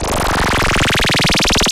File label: FX Scratching; vengeance essential club sounds-3